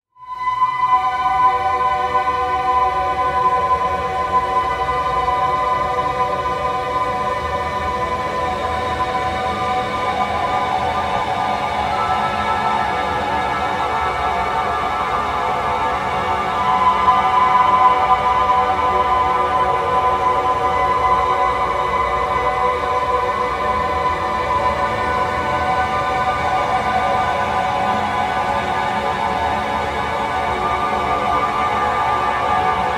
Badinerie with Spectral Freezing; SOUNDMAGIC SPECTRAL; Michael Norris

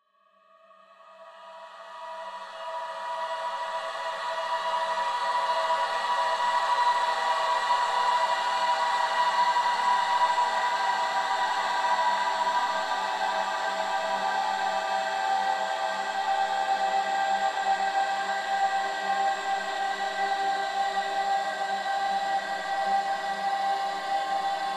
rope spectral drone maker; Women's Song with Spectral DroneMaker; Michael Norris

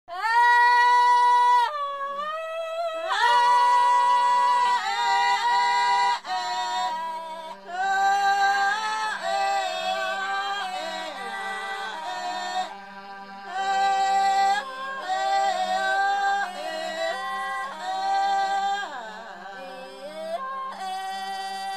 Women’s Song from the Solomon Islands; Michael Norris

